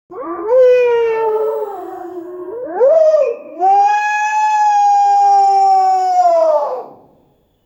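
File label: giant mythical deer scream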